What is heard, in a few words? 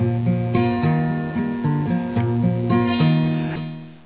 dean guitar; Dean playing his guitar